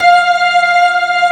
P-ORG F5 L, POWER ORGAN

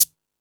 Beat Box; E-MU Pro-Cussion

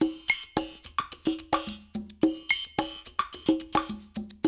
DNCE, World Dance, Dance Coil; Spectrasonics; Supreme Beats

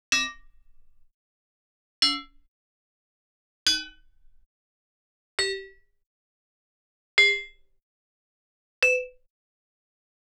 Gamelan; Propeller Island - Complete Gamelan

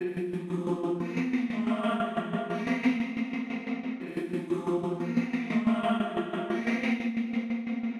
Stuttered Vox 120 BPM F min; Vocals; 99Sounds; Monosounds

